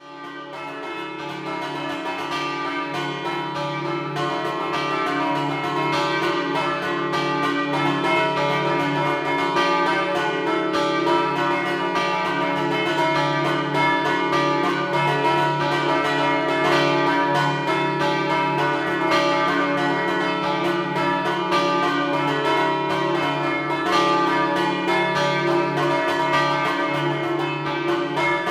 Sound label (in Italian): GODIA, campane